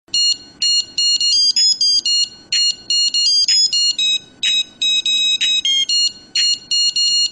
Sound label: Sms Tone; Cadbury